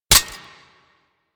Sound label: Revolver Rifle Mech; Warframe; Tenno